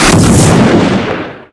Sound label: detonate, turret floor